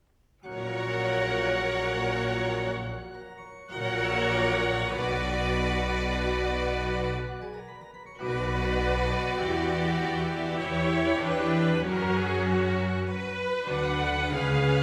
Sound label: Trumpet Concerto for trumpet, strings & continuo in D major, Grave; TWV 51: D7; Trumpet Concertos